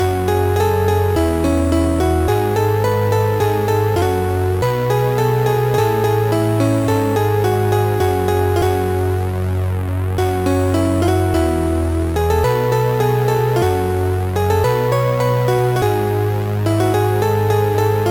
piano song; Trackers; Modland

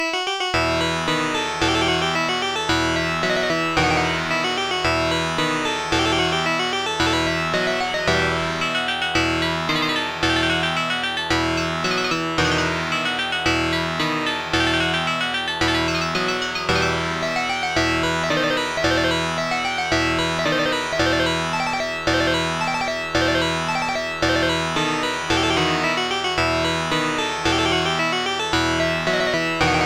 tambourin; Sonix Music Driver

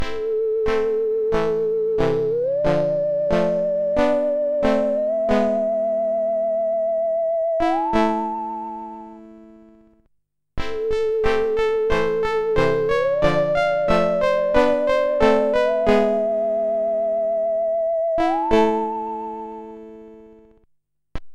jingle; MusicLine

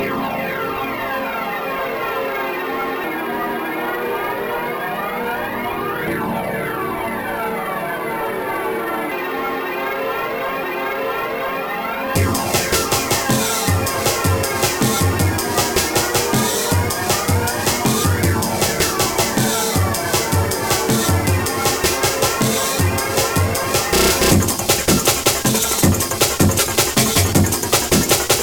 lousy drum n bass; Trackers; Modland; Panik

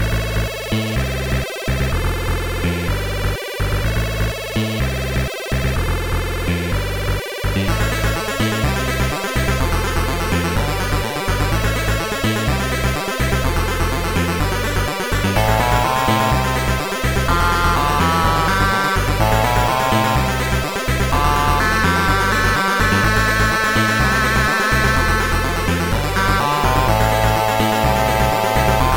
synth v noise

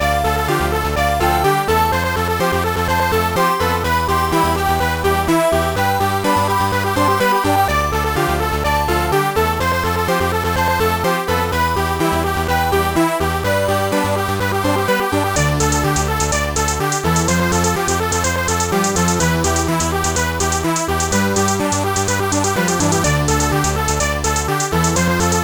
sad sounds, mod, Trackers; Modland

